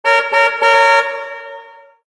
car horn; Source Engine; tf; mvm warehouse

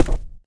plaster, player footsteps survivor walk; source engine; left 4 dead 2

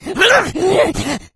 rage, infected female; npc; Left 4 Dead 2; Source Engine

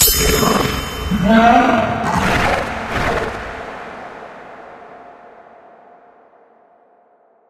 medal click rare; ui; yeti

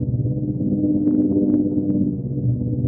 citadel sick drone loop; ambient; source engine; episodic

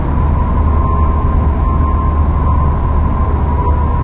mech whine, ambience

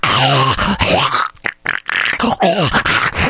shoved long, npc infected; Left 4 Dead 2; Source Engine

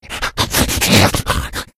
rage at victim, infected; Left 4 Dead 2; Source Engine

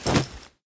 item hat drop

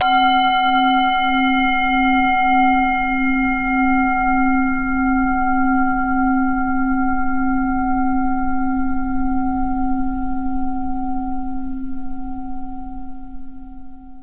zen prayer bowl; Source Engine; tf; japan fundraiser

